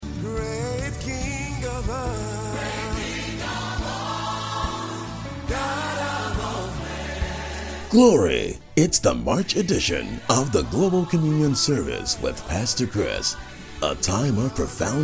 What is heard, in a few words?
Jingle